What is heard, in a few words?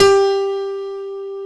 POP PIANO G4